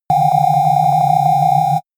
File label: shot ringing wobble